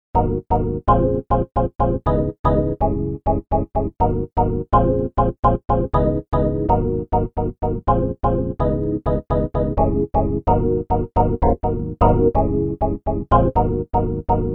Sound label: Organ: worst of the nineties dance, Emu Xtreme Lead-1